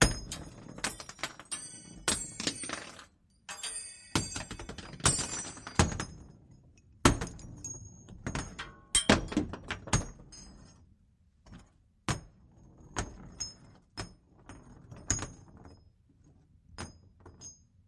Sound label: Shell Casings: .50 Caliber Shell Casings Fall On Pbr Deck. Dual